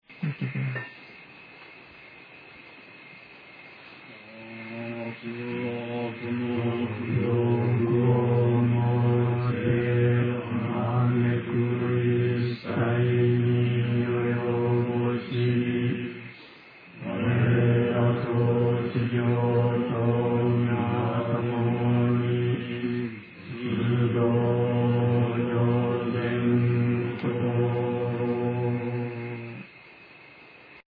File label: chant